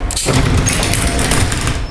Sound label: opening doors, tram